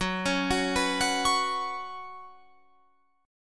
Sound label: F sharp Suspended 4th, Guitar, Open F; arpeggiated